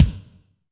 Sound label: Kik Hi Foot RM, Drum Machines Alesis D4